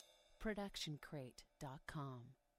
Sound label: ride cymbal 20 inch; soundscrate